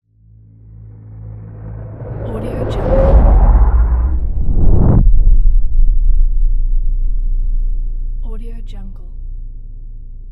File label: cinematic deep power whoosh; prowaveaudio